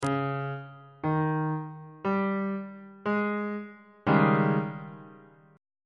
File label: g sharp major 7th suspended 4th 1st inversion on bass clef